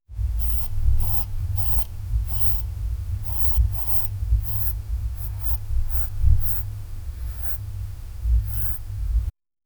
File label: the gentle sound of a, beauty